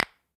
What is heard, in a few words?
Move